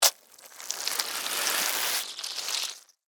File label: Mosquito Bite, Animal, Mosquito, Bite, Suck, Blood, Insect, Bug; Mosquitoes; BlastWave